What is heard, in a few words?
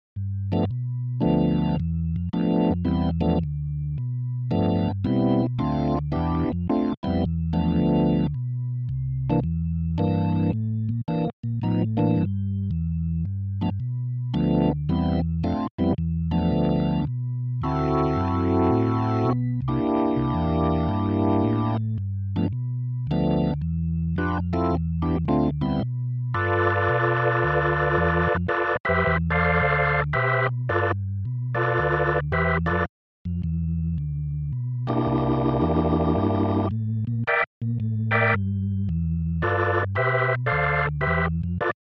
B4 Dirty